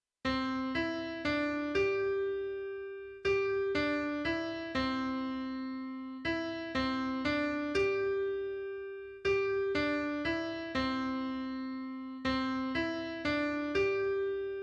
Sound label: chime